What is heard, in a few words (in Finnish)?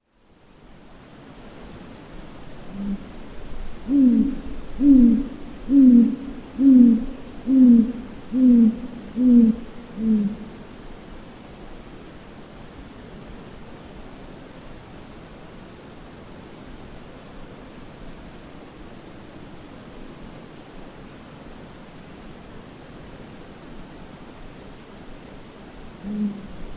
lapinpöllön ääni kuulostaa ihmiseltä